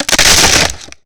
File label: Wood Snap